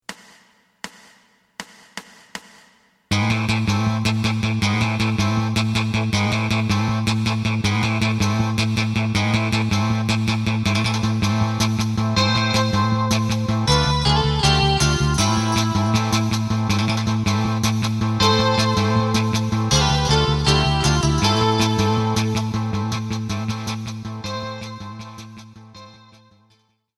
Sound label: drum